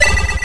demon bullet; mazeofgalious

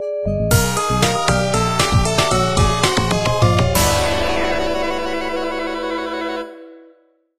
gameover; opensurge

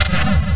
fireball; mazeofgalious

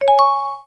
yippie; lix

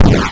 destroy; opensurge

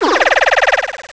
charge; opensurge

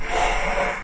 hatch; lix

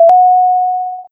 underwater tick; opensurge

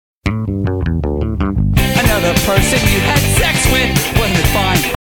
music; FreeBASIC; SDL

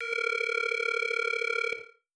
Outgoing Call; toxic

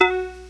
Ping; gnustep

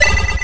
demon bones; mazeofgalious; jorito

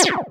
bumper; opensurge

